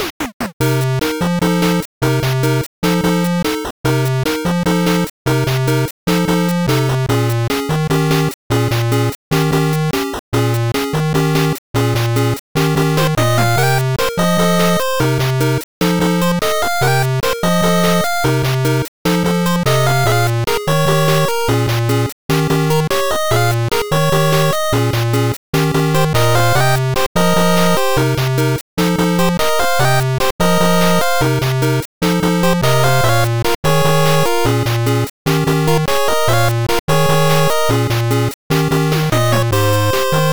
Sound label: 8bit Bossa